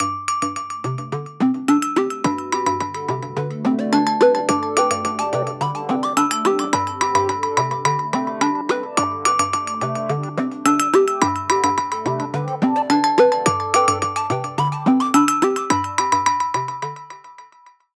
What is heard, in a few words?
Beats and bops